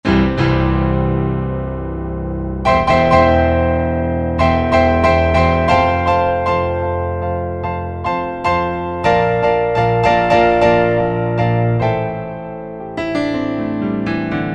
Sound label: Piano 16', Korg 05RW